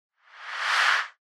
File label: Noise Sweep Mid Reverse